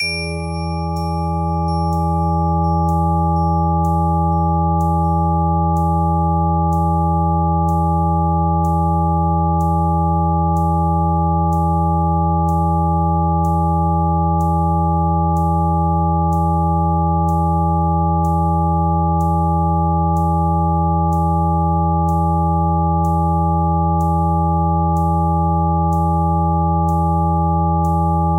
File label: Zen Dominant in E; Mike Cirillo; Musical Self-Actualization